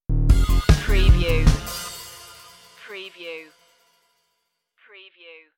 information age stinger

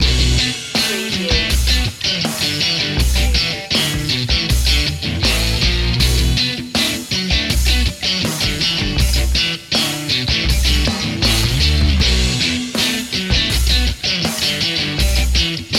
rock life loop

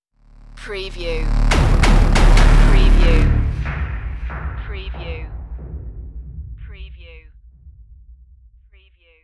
binary clash stinger

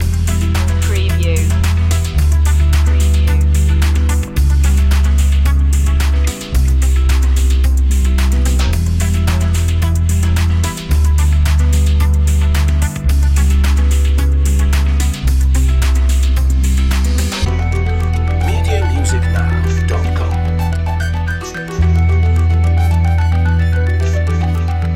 positive guitar background

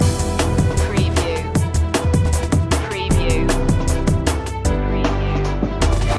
car advert music loop; Soundtrack / Action / Chase

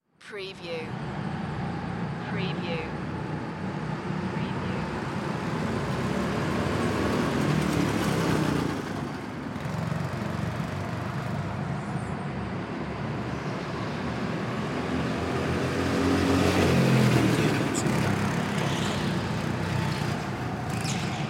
Go Kart Track Race; sound-effects-bible

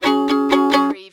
Cartoon Violin Strum Strings

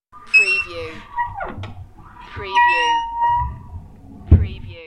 Creaky Door

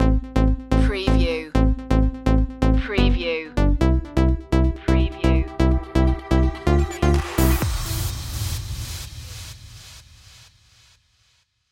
trance n easy stinger

